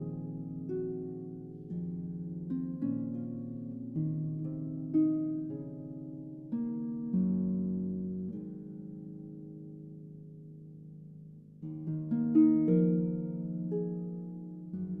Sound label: Harp Concerto Lullaby